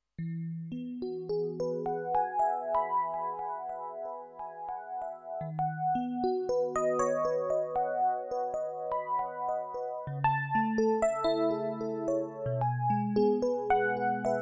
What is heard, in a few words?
Epiano